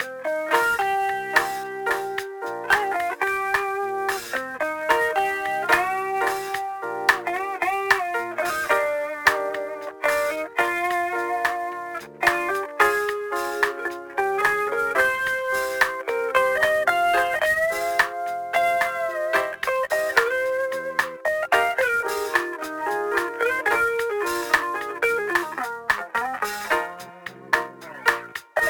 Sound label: Elevator Music